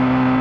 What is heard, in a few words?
car acceleration high; 88200hz; mono; int16